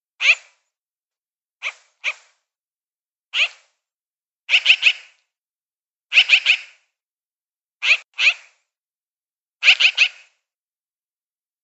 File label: Magpie chirps; ORION; Birds